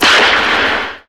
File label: gun shot; Crash Bang Boom